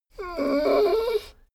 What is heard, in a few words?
dogo argentino whine desperate; natur-tiere; hunde